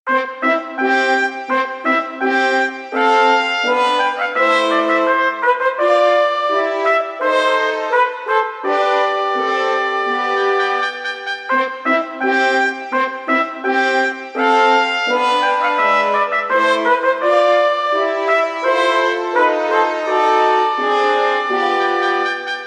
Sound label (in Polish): Hejnal; Tarnobrzega